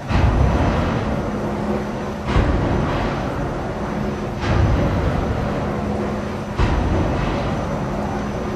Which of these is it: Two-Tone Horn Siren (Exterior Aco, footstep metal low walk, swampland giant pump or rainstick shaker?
swampland giant pump